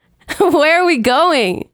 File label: vocal where are we going 90 B maj